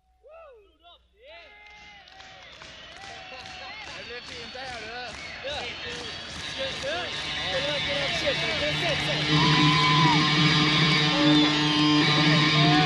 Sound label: Guitar Solo